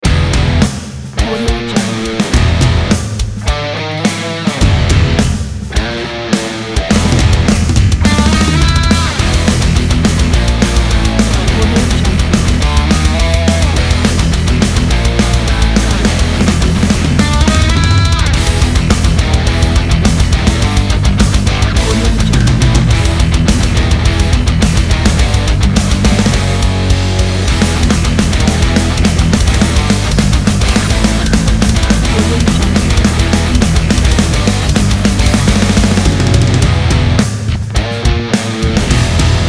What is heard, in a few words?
metal